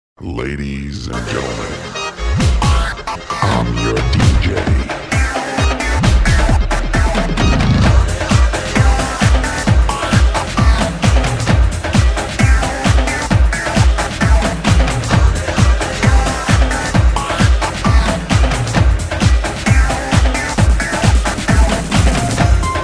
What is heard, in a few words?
Hip Hop